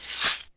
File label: BEAD SHAKER, PERCUSSION; Back In Time Records - Big Bang